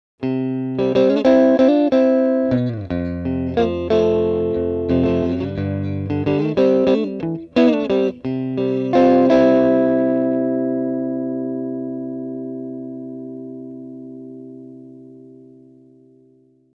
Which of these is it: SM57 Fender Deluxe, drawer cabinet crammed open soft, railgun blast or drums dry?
SM57 Fender Deluxe